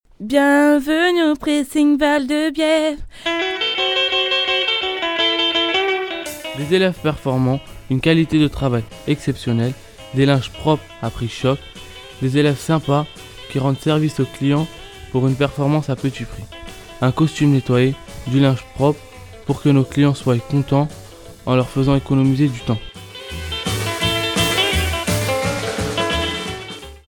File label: jingle